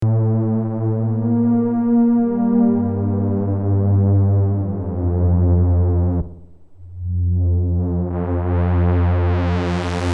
boutique jp08 pad; roland

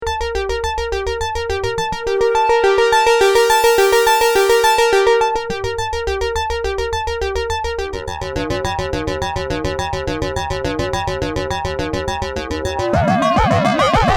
ring synth, vermona rm1

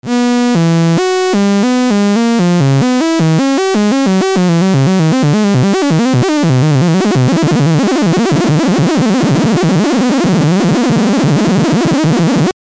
lfo pitch sh, synthesizer basics

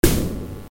snare

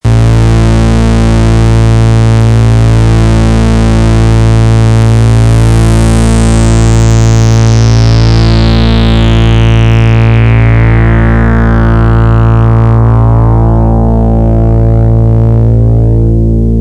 SAW, synth; simplesynth; simplesizer5

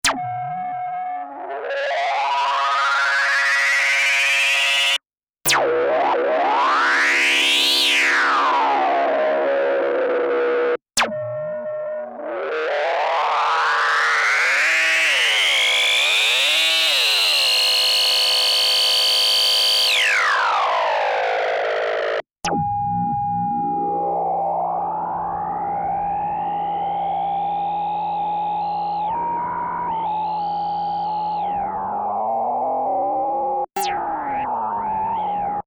origin filter FM; ARTURIA

